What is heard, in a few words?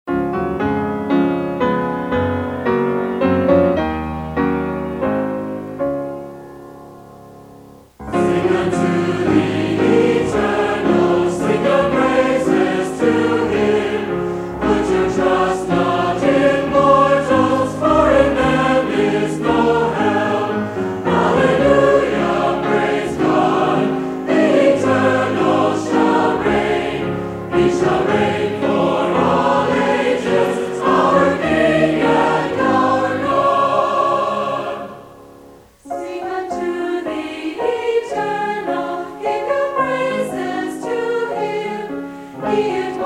hymnal, chorale; Church Materials; AC Chorale; Hallelujah Praise God